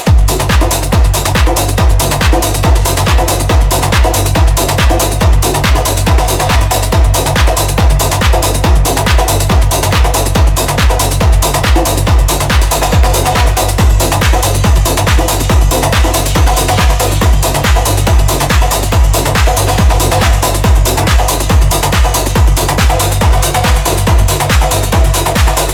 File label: TIMBAL GROOVE